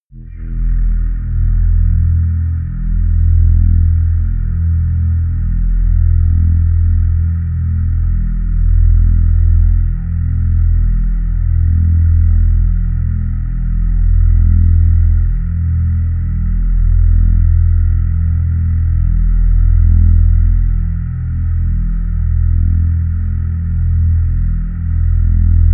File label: drone hollow drone